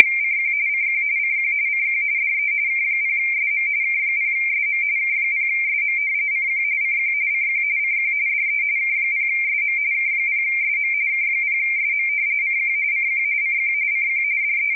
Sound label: 512 tap bpf